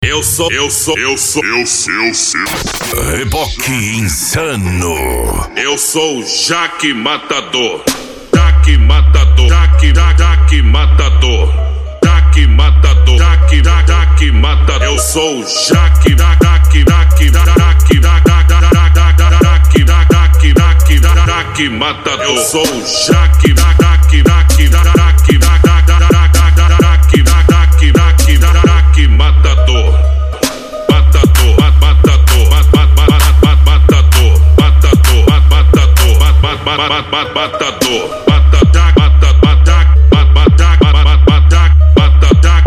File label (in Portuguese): noia dance e funk mega bass